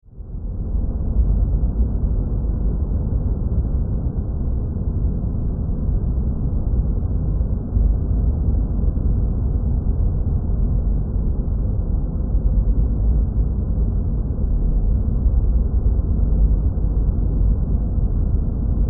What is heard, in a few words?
Science Fiction Planet Atmosphere Deep Rumble; Sci-Fi